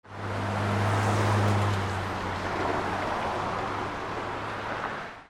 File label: HIGHWAY